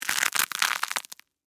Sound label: Texture, Crunch, Cereal, Honey, Oats; Crunches; Objects